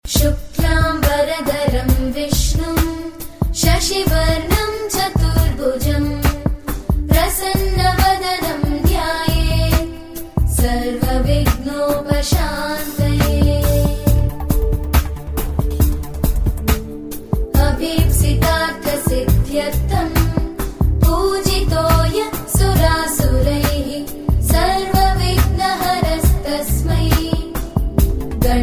Hindu Devotional

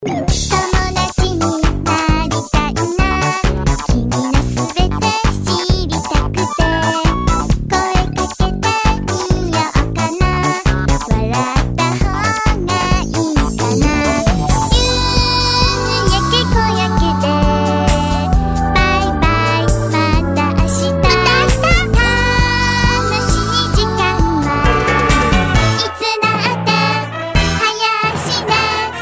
Japan Cute Song

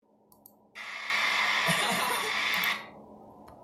Giggle

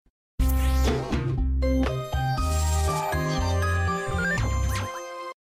Sms; Nat Geo

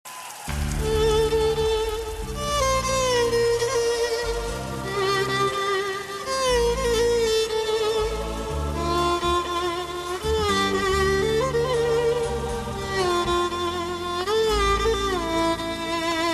Sad Violen

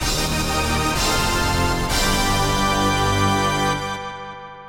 Jingle

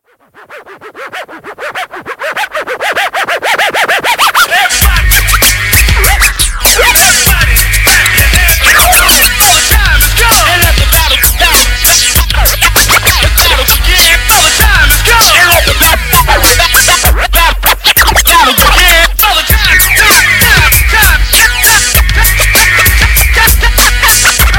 Scratching

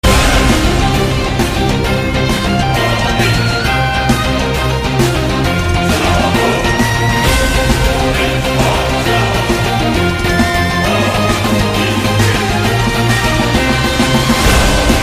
Metal; China

